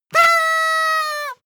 paper hoot loud

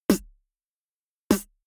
beatbox snare punchy dry

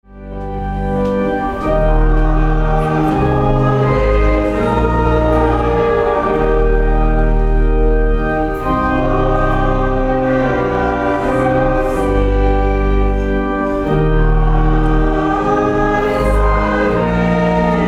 song organ caribbean

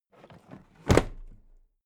toolbox put down heavy

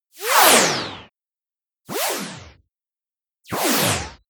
charge

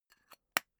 tobacco tin close

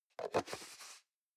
plastic can small lid screw single close

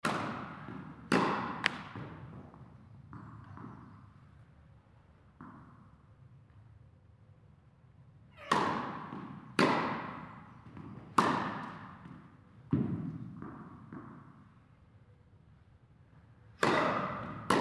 indoor tennis game

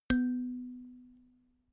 notification kalimba single low

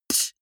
beatbox snare damped

